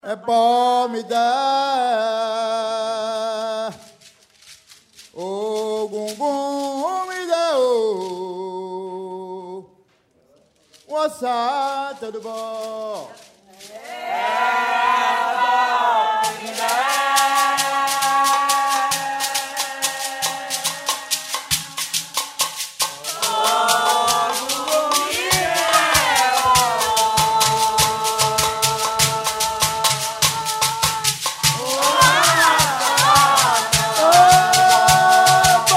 toada para orumila

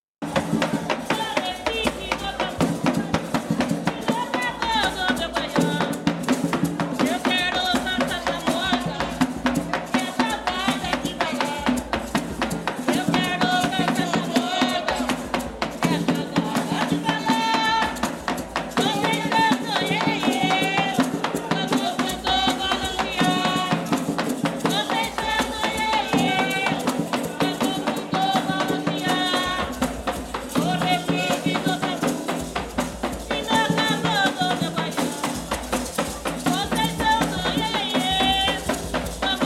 batuque de umbigada tiete repique do tambu

